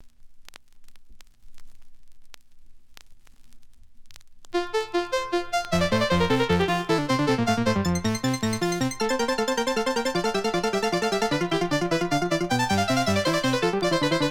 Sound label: Two-Part Invention In F Major